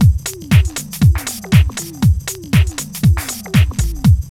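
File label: drum loop; X-Static Goldmine 3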